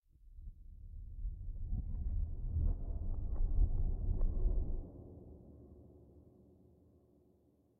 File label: Room placed low